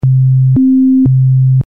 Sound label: sine; nord3; サイン波